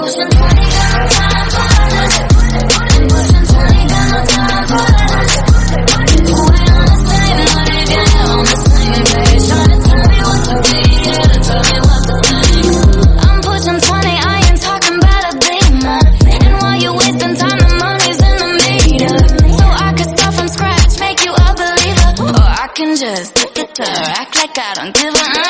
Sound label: famous song tone ringtone, english